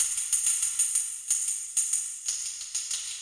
tamb; Torchlight III; PS4

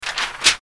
Paper; Lazy04 Paper

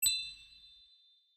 Bonus Appear

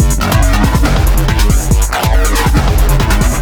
Electro Speed

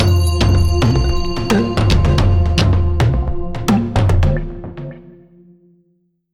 basicMood Cueva Bosque

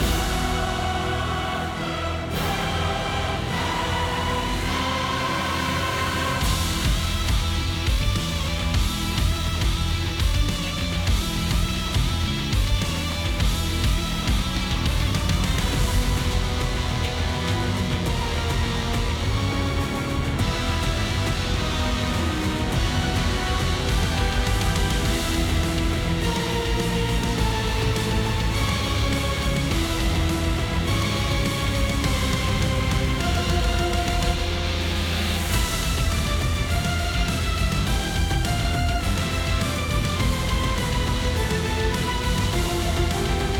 Battle Boss Gatekeeper Cerberus

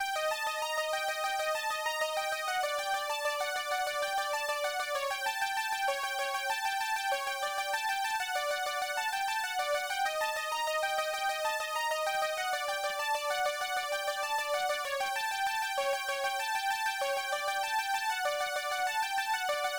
Synth Sequence 8 Bars; Vostok Inc.

